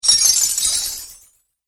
Magic Chain Impact

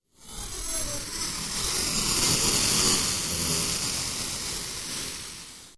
Whoosh Space Vehicle Slow Spacecraft; Scifi Science Fiction

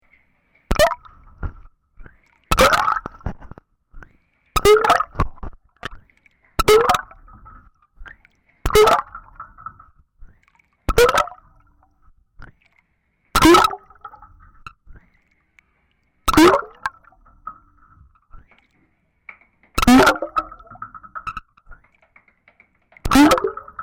MICROPHONE DUNKING; Various strange sounds; Kitchen; Household

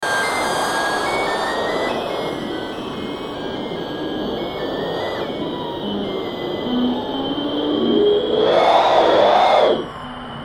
Feedback Musical Swells

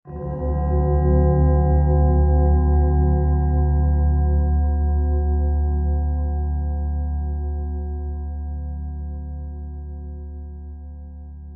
Synth, Stab, Mysterious; Production, Element, Bell; Stabs; BlastWave

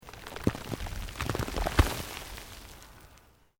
EARTH LOOSE MOVE LONG, Debris; Material components for constructing more complex sounds; Materials

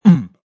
Vocal Deep Mmm

